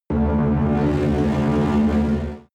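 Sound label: extrinsic hum, energy; energie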